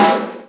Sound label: pork, snares real